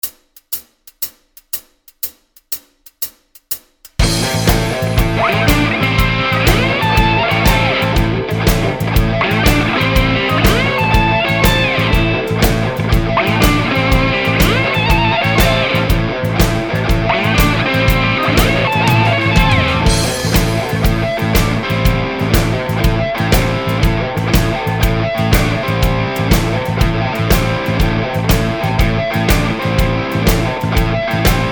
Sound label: slide mix